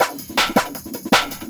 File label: Loop, Drum & Bass, 160 BPM